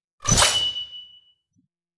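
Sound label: Sword Slash Transition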